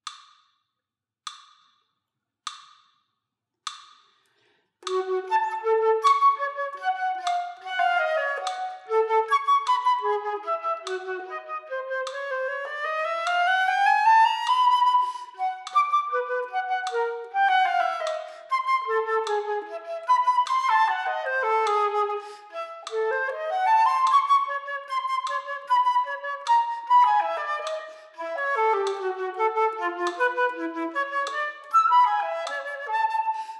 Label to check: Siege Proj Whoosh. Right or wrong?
Wrong